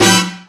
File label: Tasty Brass; Mo' Phatt; Digital Sound Factory Mo' Phatt